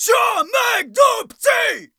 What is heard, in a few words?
Battle Cry, Viking Vocal, Un-Processed